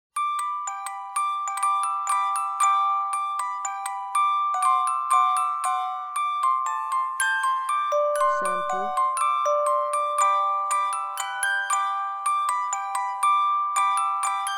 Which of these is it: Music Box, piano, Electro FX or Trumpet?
Music Box